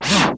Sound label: scratch LoR, Yamaha Motif ES; Yamaha Motif ES-Series